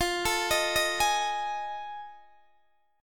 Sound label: F 7th Sharp 9th F Guitar Open D